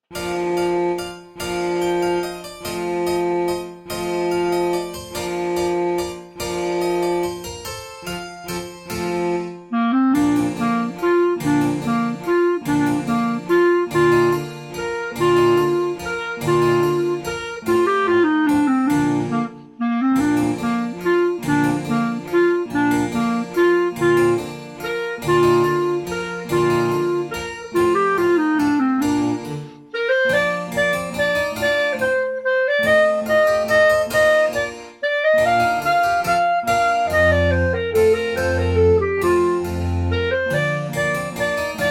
Carnival Clarinet

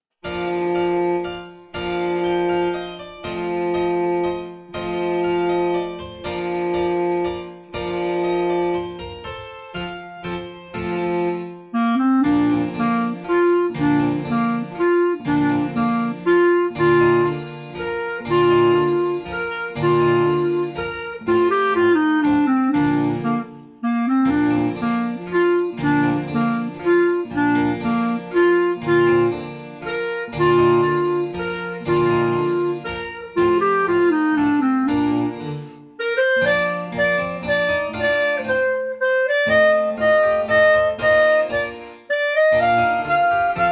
Carnival Clarinet; 20pct Slower

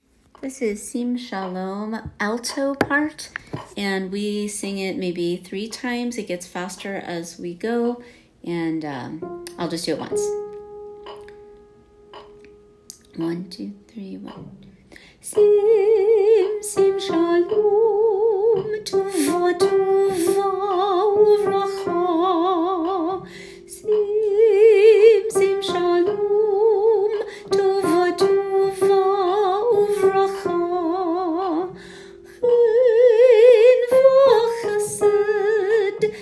Sim Shalom Alto; Sim Shalom - Shifman

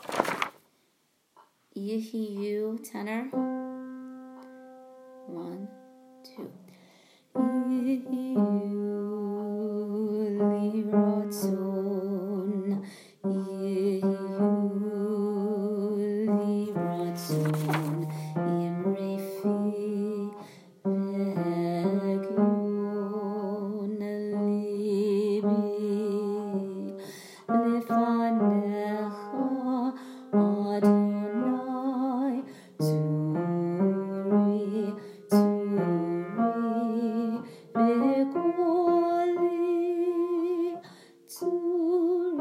Yihyu Lratson Tenor

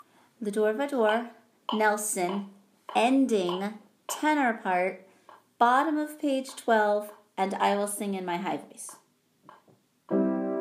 Ending Tenor

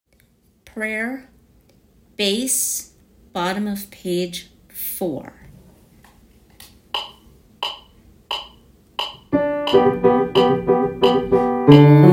Prayer Bass; Broadway Shabbat

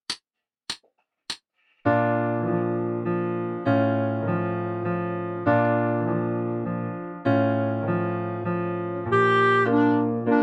Wooden Shoe Dance Clarinet; 20pct Slower

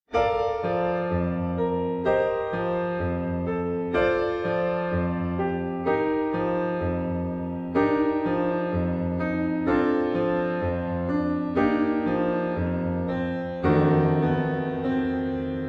Romance w Sax; Part 1 Reduced

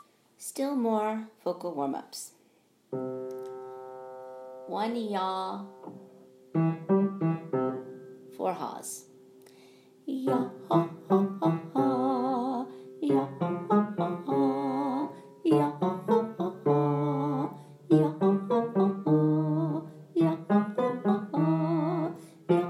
Warm Ups; Broadway Shabbat

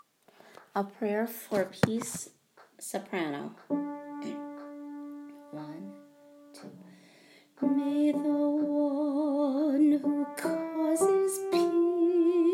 A Prayer for Peace Soprano; High Holidays

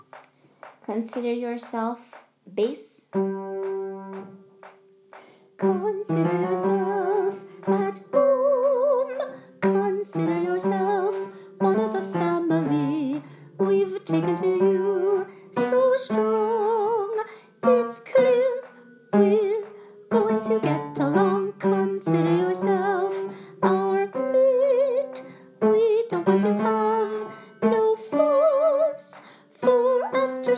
Bass; Broadway Shabbat

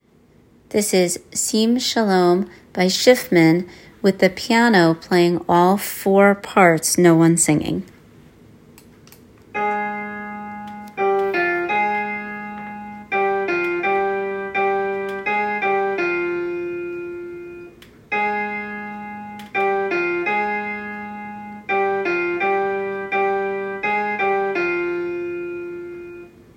Sim Shalom 4 parts no singing; piano; Sim Shalom - Shifman

